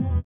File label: SITAR; Spectrasonics; Metamorphosis; INDIATEK